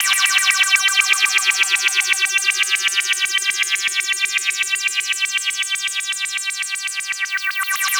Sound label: SFX, Trance NRG